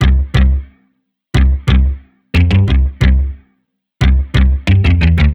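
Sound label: Huge Clap, хип хоп; Bass Mute; rap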